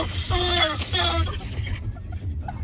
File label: scream in pain